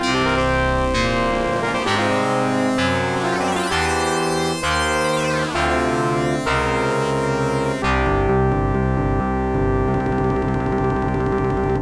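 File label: background music; Black Tiger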